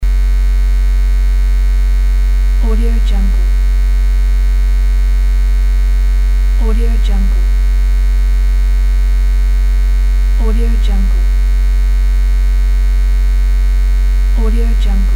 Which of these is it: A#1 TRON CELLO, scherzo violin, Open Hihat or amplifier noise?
amplifier noise